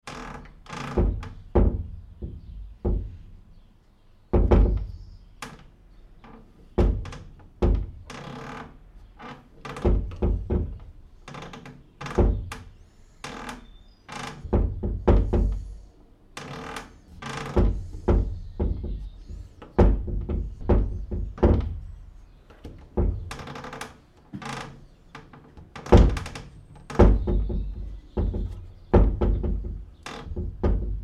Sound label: Door Creaks and Bangs in Draft, interior wooden door; Creaky Doors